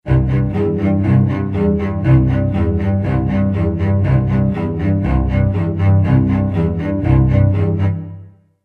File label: Alberti 8ths